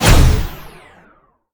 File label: Laser Shot, Sniper Rifle; quakeball